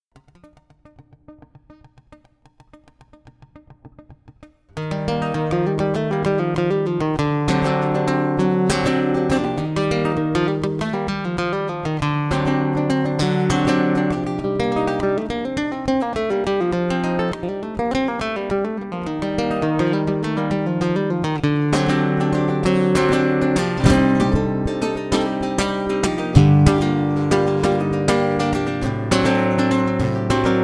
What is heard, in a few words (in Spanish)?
por rumba